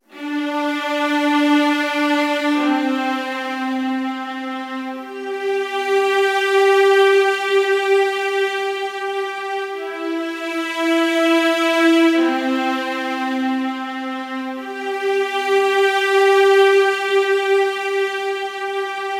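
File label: Violas - D minor - 100BPM; Construction Kits; STEMS; Ghosthack; SkyRealm; Kit 17 - Forgotten Glory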